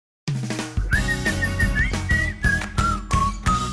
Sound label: whistle; imosae; Imouto Sae Areba Ii